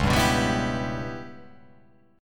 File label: C sharp Minor 7th Flat 5th C sharp